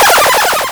mana; CHOMPStation2; arcade; Ambiance and Sound Updates

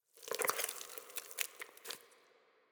hatch, alien

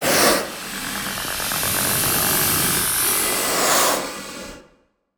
hiss, alien voice

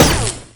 Taser, weapons

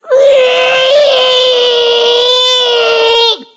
reee; Bubberstation

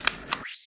laser Pump

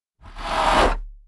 shadow attack; Paradise; shadowdemon

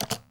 terminal button, machines

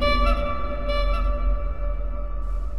honk echo distant

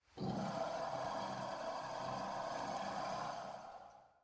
low hiss, alien voice

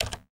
terminal button, machines

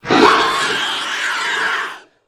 screech, alien voice

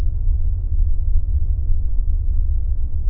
cave loop; Spookystation

